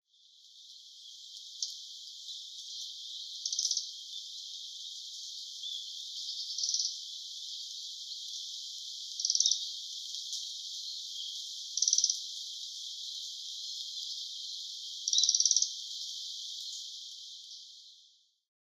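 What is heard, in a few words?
taiga flycatcher, utsunomiya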